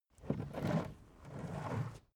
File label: carriage hatch slide